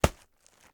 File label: THUD Bright Movement; mono; Universal Sound FX; THUDS & THUMPS